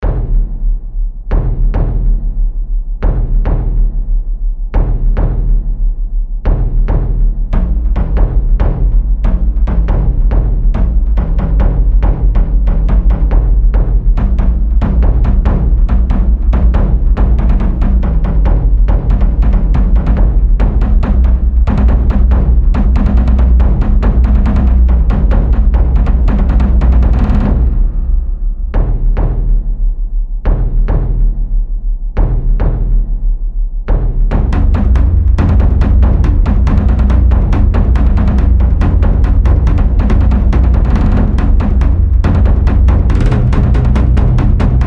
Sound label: Taiko